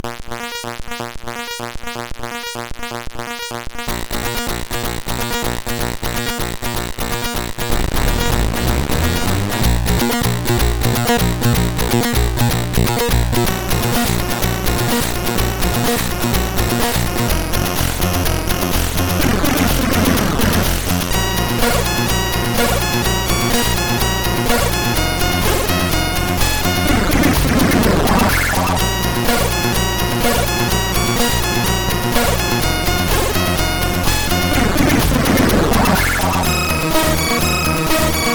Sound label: Game Music, sid MOS6581R2